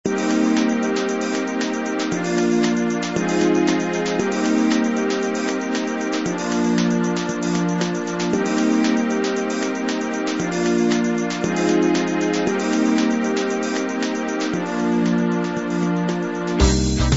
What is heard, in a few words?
pop ballad